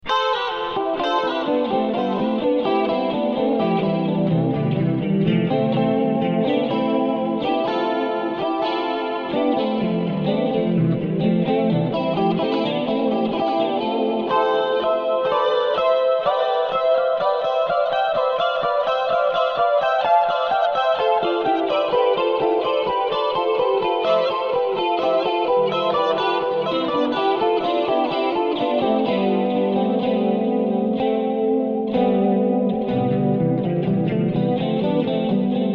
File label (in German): Concerto Harmonist C-Dur